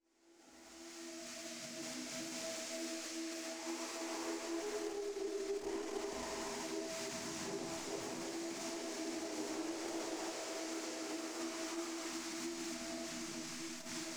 Magnetic Field; 99Sounds; Magnetic Fields

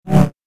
Double Bladed Saber Sound; FXHome

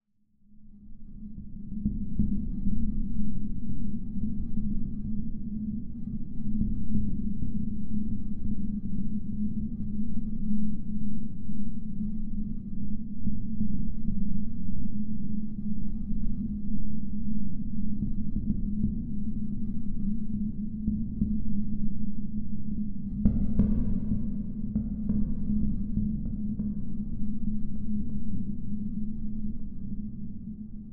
Magnetic Field; 99Sounds; Magnetic Fields

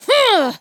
voice female attack; Gamemaster Audio; Human Vocalizations

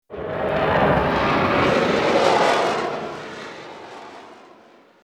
Bullet Time; FXHome; Bullet and Gun

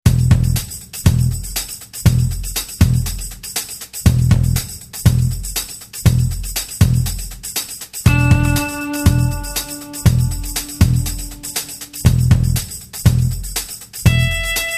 funky

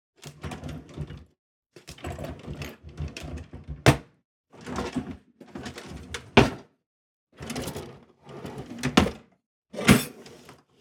KITCHEN drawer opening closing

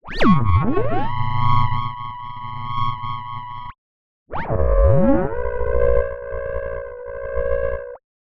System Boot, Digital UI; Transverse Audio

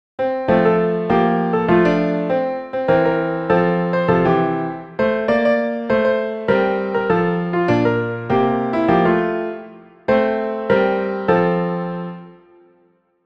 Piano

